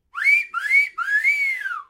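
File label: cartoon whistle at the sight of a beauty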